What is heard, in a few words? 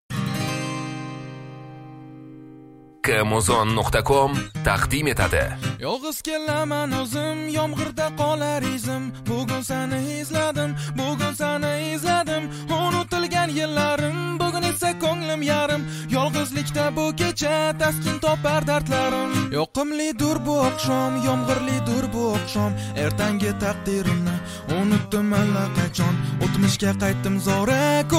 guitar